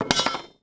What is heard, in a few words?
pipe drop wood; objects; Star Wars Obi-Wan; XBOX